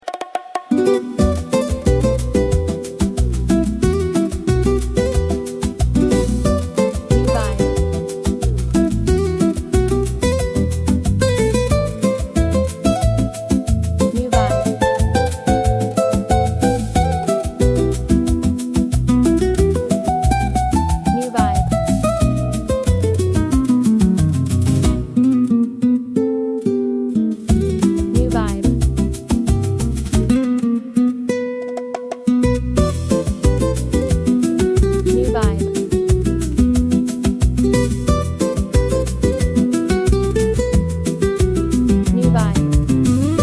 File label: Greek bosa nova